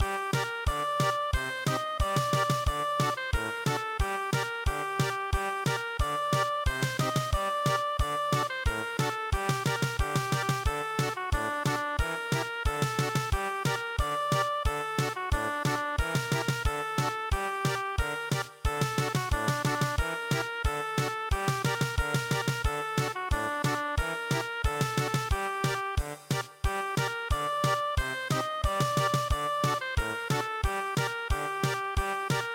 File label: German Polka, midi; Orange Field